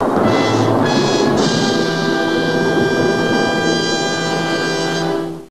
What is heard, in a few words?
dramatic music; Voice